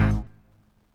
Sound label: Synth Stringz